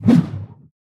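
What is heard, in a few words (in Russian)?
глухой звук замаха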